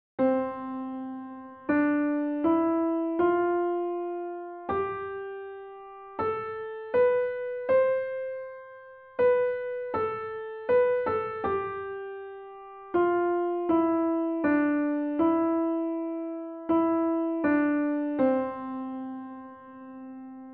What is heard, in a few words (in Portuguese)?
escala diatonica